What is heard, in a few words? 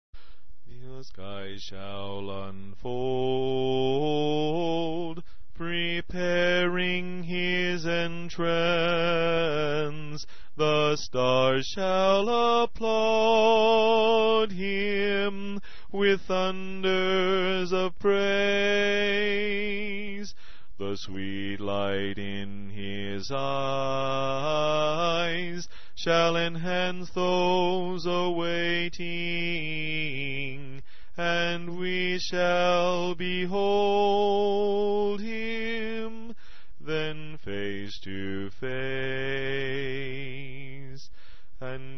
Bass